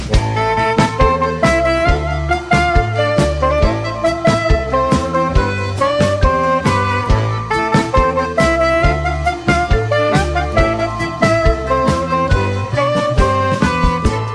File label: polish music